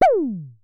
Synare Filter; Star Instruments Synare 3

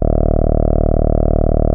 TAURUS E2, synthesizer

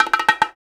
PRC, Bongos; Spectrasonics; Supreme Beats; World Dance

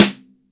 MIX SN, DRY STANDARD SNARE

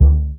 Foley lo; layering

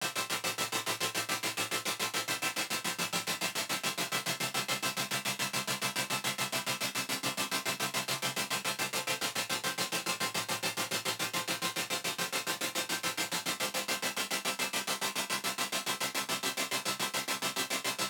weird percussive texture

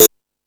Discoball, Drum Machines E-MU Pro-Cussion

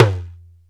talking drum, Ethnic Percussion

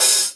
HH dark OP, Drum Machines

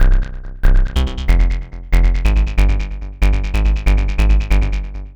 Synth Bass

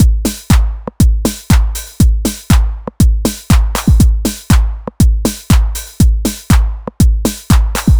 120 trance beat, Drum & Perc Loops

